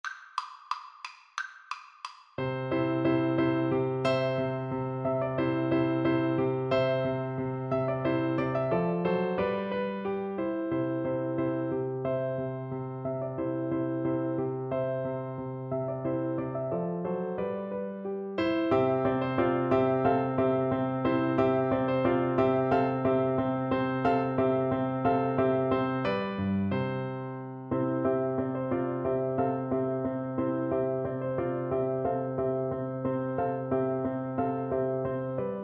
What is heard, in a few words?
vivaldi spring, oboe